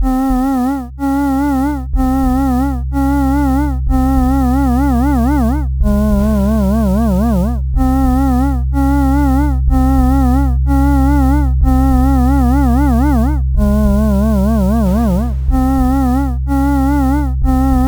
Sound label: dark; survival